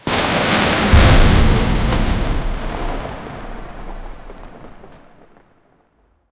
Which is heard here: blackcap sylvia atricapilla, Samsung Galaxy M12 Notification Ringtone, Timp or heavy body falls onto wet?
heavy body falls onto wet